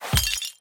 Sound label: hit; Fantasma; hades River Of Souls